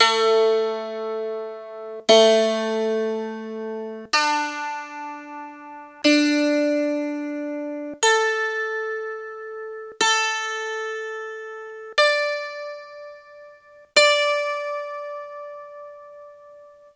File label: Kantele Plucks Traditional Modified